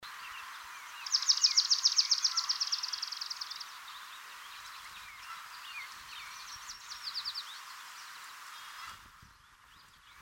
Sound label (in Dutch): Moerasgors Melospiza georgiana